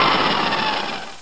spindown

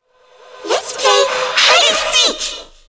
dark zm idle, zombie; zombie plague

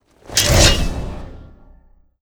ham draw; cstrike; apokalpsis

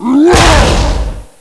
Attack Body, zombie; cstrike; Nemesis

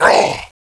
secret hit; cstrike

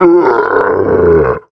Scrake Infected; zombie plague

